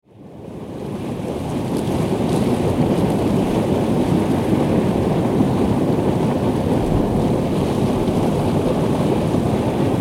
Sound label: fairy falls geyser